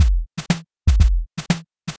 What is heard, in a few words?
shuffle 120